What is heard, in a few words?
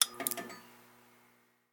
Lamp On Camera; Game rip; MiSide